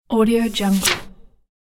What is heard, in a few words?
page turning